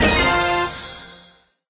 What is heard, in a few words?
get powerpoints